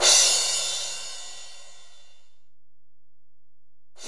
Ride, Alesis DM-PRO, Drum Machines

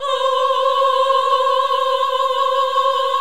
VIB F C5A -R, Symphony of Voices; Spectrasonics

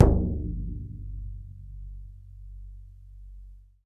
BODHRAN, Celtic Percussion

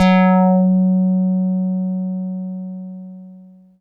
f#4, Korg Poly-800; Booty

